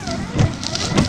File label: robot rotate